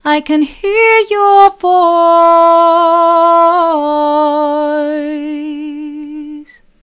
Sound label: Cave Reverb Female Vocals Dry; Echo Chamber; Echo Chamber is a stereo reverb and delay plug-in; Syntheway